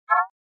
Mouse Over Elect Whip

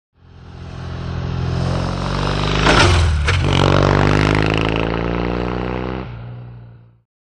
Over Ramp Triumph, triumph

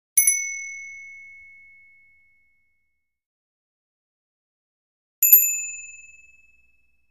Bells Various Bell

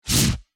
Short Heavy Fire Exti, fire extinguisher

